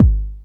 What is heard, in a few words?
Bassdrums